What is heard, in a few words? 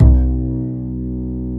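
double bass B2; Univers Sons; Jazzistic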